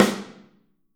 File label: Snare OH; Snare OH All; Drums Percussion